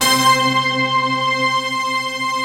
C5, STACC ORCH; Best Service; Advanced Media Trax Modern Composer

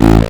Bass A, Offbeat Bass; Vengeance - Essential Clubsounds Vol.2

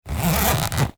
Zipper, household rhythm samples hits

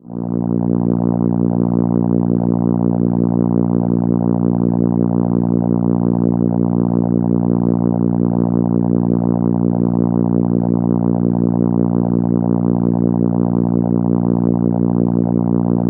Contra A#3, ARP Solina

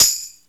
TAM

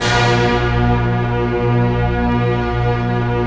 ORCH; DIGISYNTH; VFX; Best Service; Alpine Volksmusik

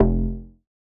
Bass F, Bass Synth

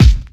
KICK, Big Beat; Big Beat Samples & More; AKAI

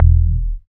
BAS FM DX-7

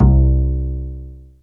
acoustic bass, Jazz Brush

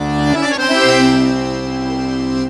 ACCORD HITS; Best Service; World Colours

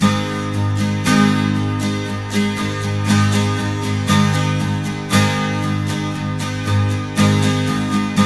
12 STR MAJ A, TWELV; Spectrasonics; Hans Zimmer Guitars VOL-1

